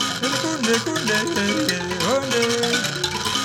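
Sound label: PLK Bass Mbira; Roland LCDP11 Africa VOL-1; M'biras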